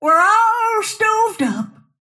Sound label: death; snapfire